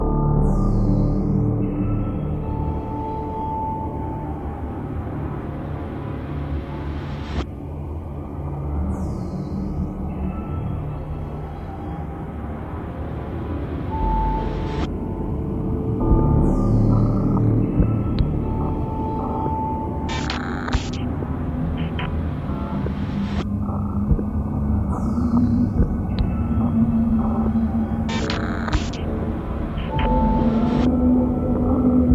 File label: containment; Submachine 10